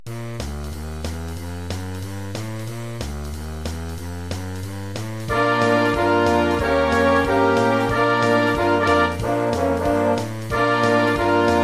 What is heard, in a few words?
Wind Band Swing